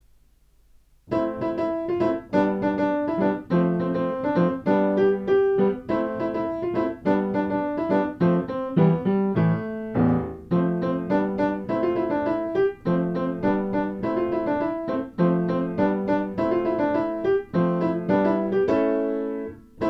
funky disco mix; compositions for piano

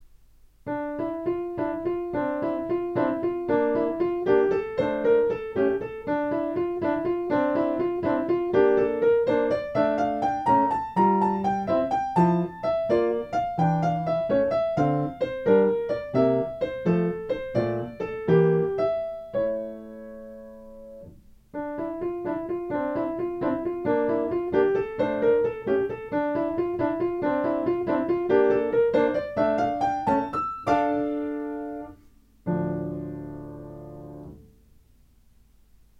prelude in d minor

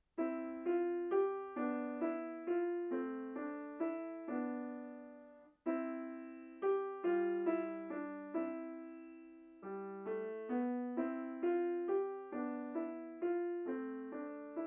waltz

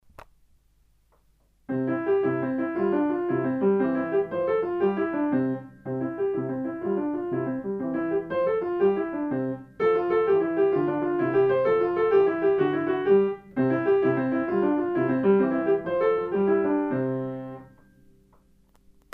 jig

